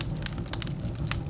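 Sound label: fire small; lander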